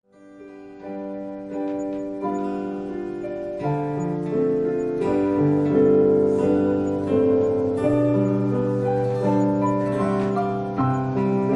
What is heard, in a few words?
Piano prelude; Job